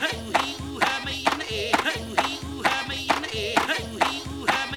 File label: HOO HE HO, ETHNIC; AMG; Global Trance Mission VOL-2